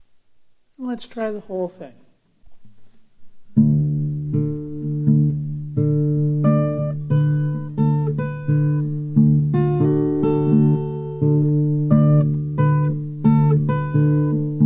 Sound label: drop D